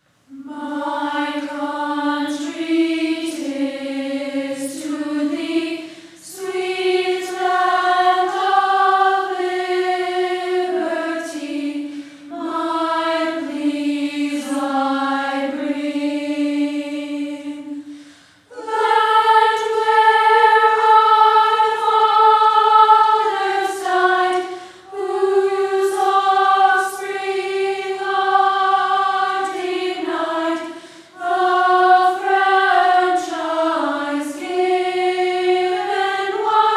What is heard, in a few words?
Childrens Choir